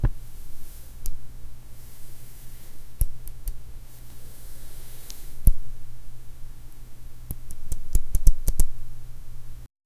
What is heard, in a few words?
slowly running fingers th, hair